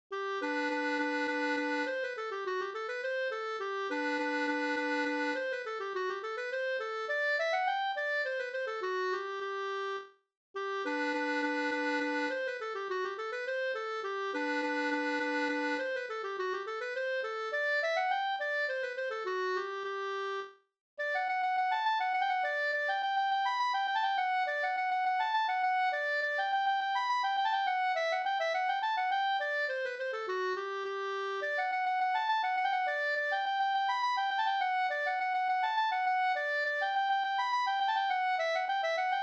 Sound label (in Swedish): slangpolska